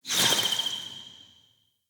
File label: Bow Projectile Flock; Weapons; Player; Bows; Fey Thorn Bow